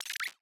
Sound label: Enter Name delete; interface